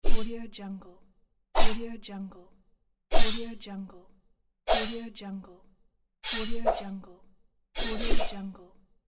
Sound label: sword swipes